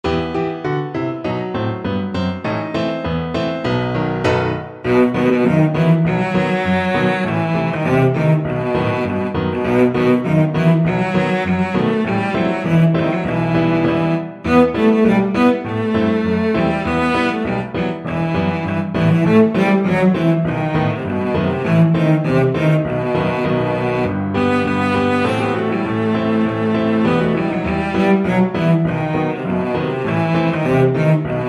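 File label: violoncello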